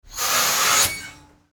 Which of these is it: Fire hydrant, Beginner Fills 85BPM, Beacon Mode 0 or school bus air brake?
school bus air brake